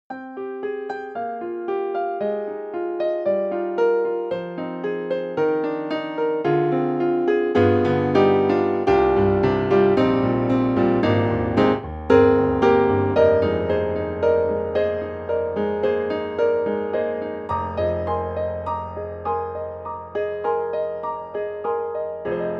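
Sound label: German Grand, piano; KORG KRONOS 2; R Ferrante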